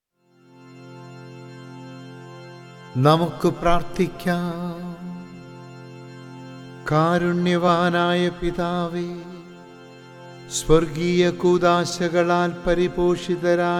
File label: Chant; Divyabhojananthara Prarthana; ordo cantus